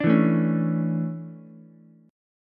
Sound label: D Major 7th Suspended 2nd, Ukulele; DM7sus2; strummed; JGuitar